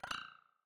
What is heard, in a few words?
graphics robotic tick; soundscrate